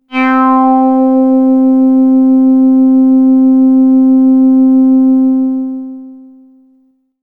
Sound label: Sun-Sigh A, synthpad